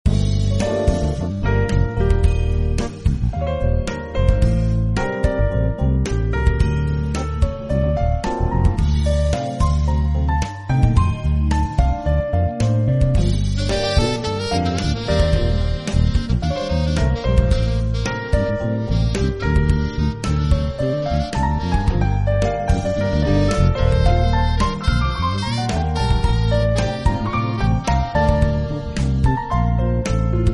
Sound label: background